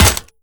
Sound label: impact, metal bicycle; Half Life Alyx; props